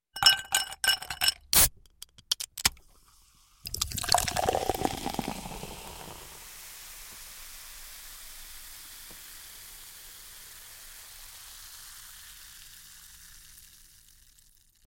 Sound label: Ice Dropping Into Glass w Can Open & Pour Carbonated Be; Household